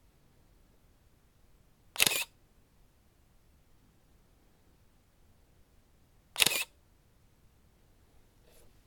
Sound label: Camera Shutter